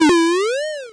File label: Jump up Down